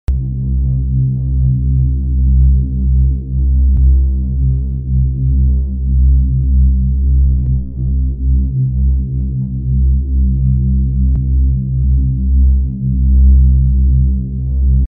cinematic background

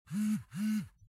phone vibrating

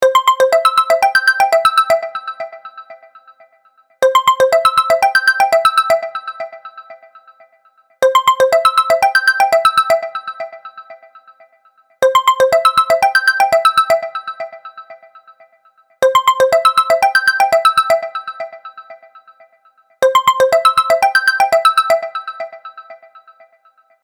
phone ringtone cabinet

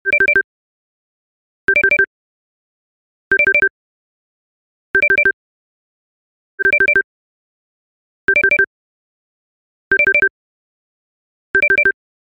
cell phone ringtone

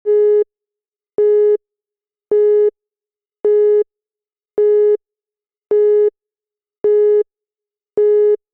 classic telephone signal

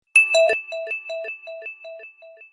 notif

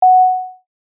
short punchy sine wave ding, F

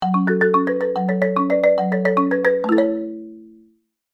marimba ringtone

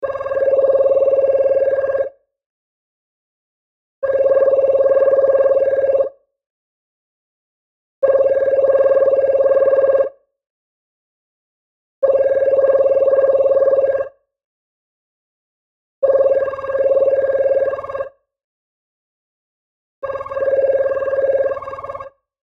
phone ringtone home

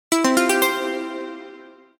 cell phone ringtone

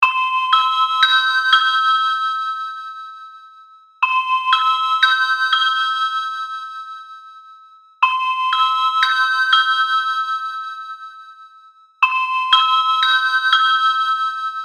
phone ringtone ringing

